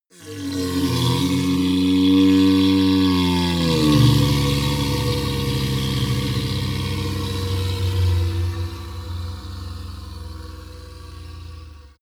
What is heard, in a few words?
golem growl painful; schreie